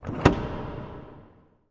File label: Bolts Var Reverb, latches